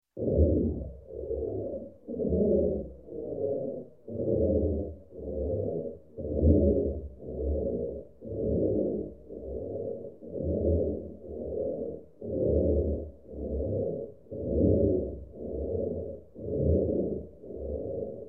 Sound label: Space Fast Breathing, Electronic; Sound Ideas 2000 Series